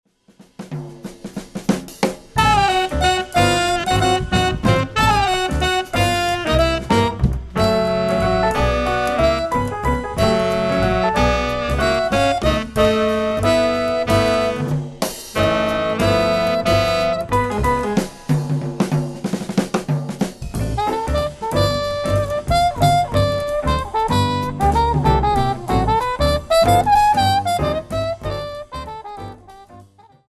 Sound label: Waltz